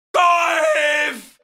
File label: golf claps